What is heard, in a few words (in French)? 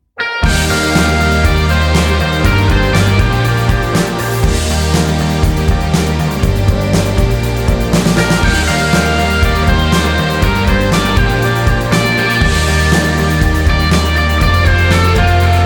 accords